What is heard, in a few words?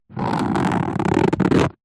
chair cover cloth fingernails scratch rub